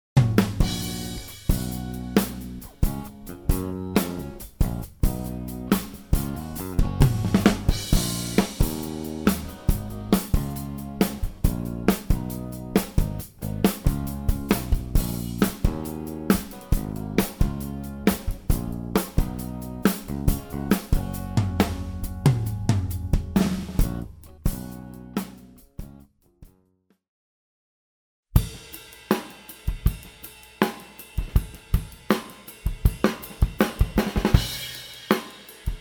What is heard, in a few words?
drum